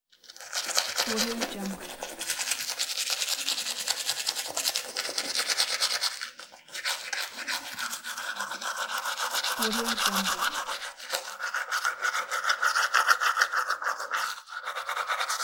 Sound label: brushing teeth